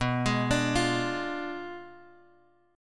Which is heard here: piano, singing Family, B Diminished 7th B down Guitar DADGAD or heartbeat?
B Diminished 7th B down Guitar DADGAD